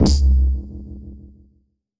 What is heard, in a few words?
cancel